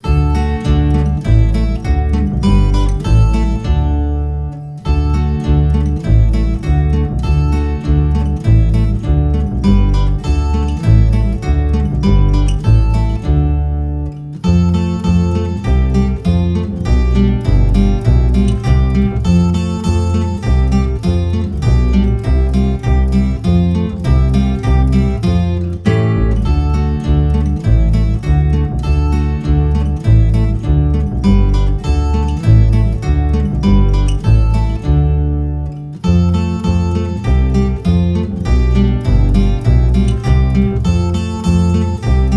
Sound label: Instrumental